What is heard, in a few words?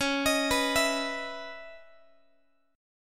C sharp Minor 9th C sharp Guitar; C#m9; arpeggiated; x,21,18,21,20,x